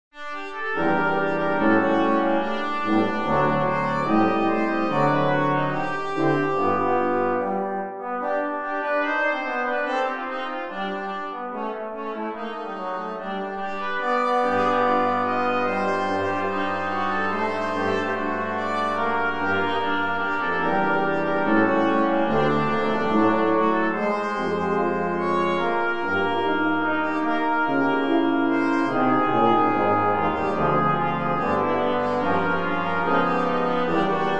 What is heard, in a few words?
quintet; 2 Trumpets, Horn, Trombone, Tuba.